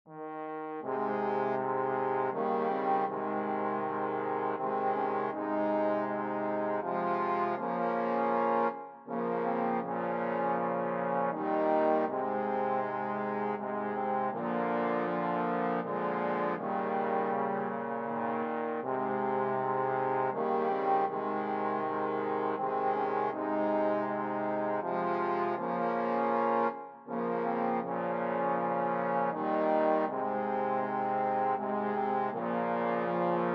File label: away in a manger, trombone quartet